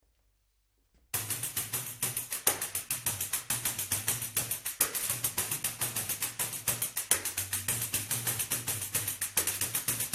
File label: pandeiro